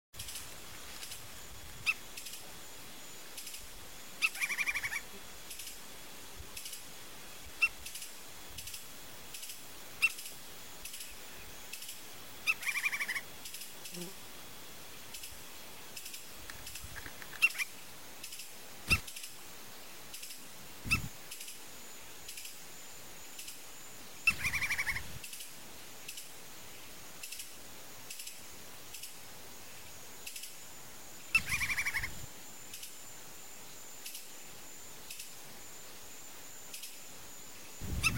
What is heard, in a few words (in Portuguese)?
Canto do pica pau de penacho vermelho